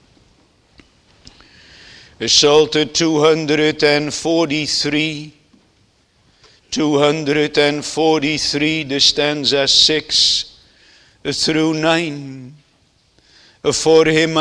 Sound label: Singing; Swearing an Oath